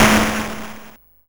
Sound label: Snare, YM, CHIPSHOP HITS DRUMS; ChipShop Deluxe